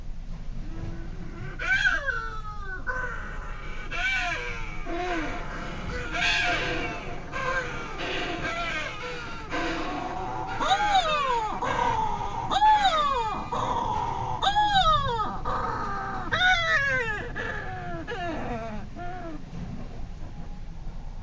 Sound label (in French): puffin des baleares